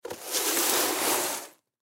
Broom Sweep Single, Broom Single Sweep Broken Glass; Foley; Cleaning; Household; Blastwave